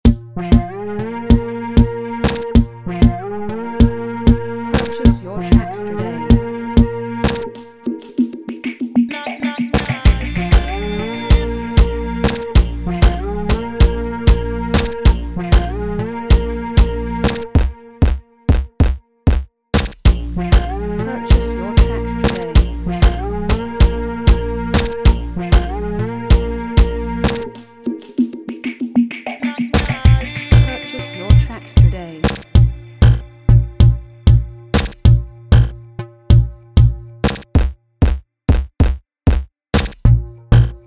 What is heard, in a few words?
DANCEHALL OLD SCHOOL